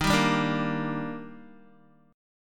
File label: Eb Major 7th, Guitar; EbM7; strummed; JGuitar